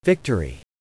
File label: victory; short